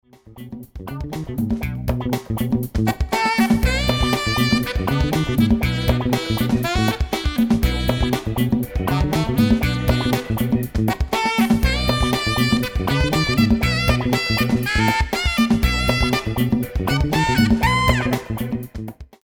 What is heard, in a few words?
Dukoff P8 funk